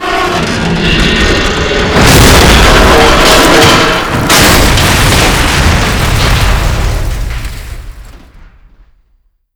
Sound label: bridge collapse; Call of Duty Modern Warfare